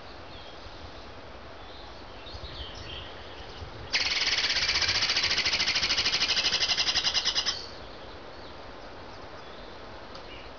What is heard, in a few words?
Squirrel bawling